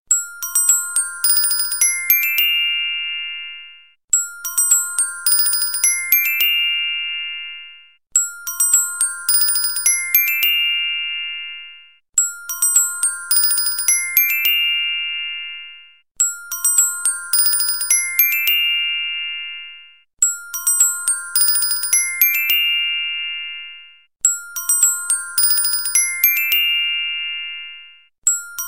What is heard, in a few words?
Little bell chimes